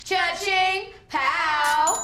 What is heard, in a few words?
ka ching pow